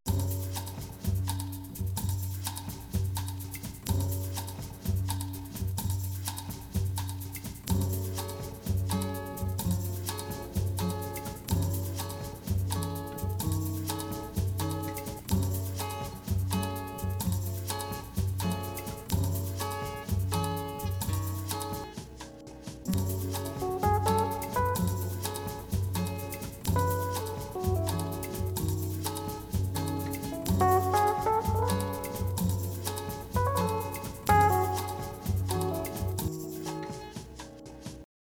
band 60's bossa nova cue

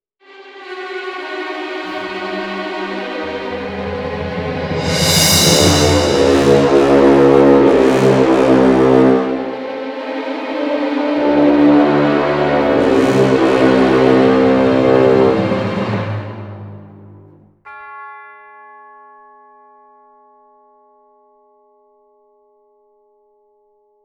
Orchestral FAKE DRAMA

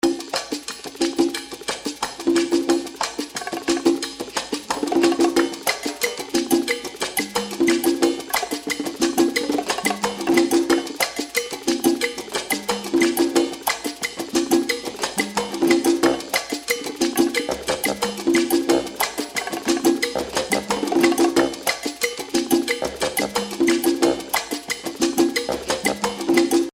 perc bari

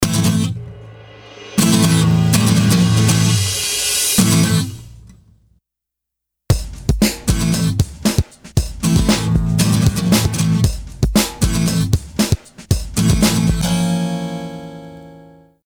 POP HIP HOP ROMANTIC COMEDY

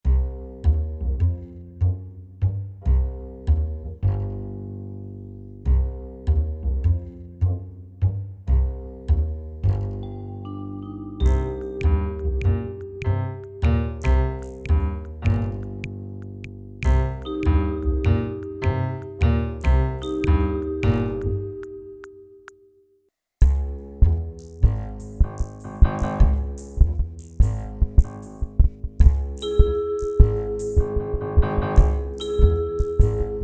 JAZZ INTRIGUE ROMANTIC COMEDY

